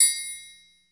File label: Perc Metallic